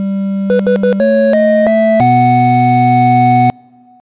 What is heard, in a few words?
victory